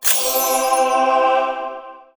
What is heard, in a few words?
win; Chest